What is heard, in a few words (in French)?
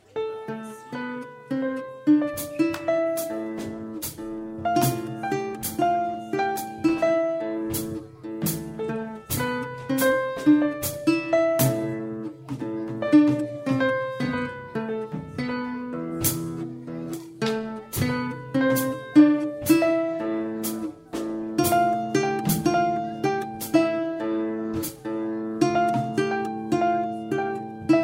Petite samba